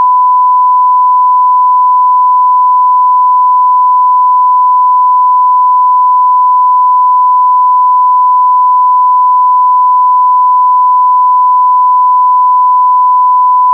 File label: Bleep sound effect